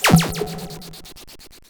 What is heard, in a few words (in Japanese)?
痺れ効果音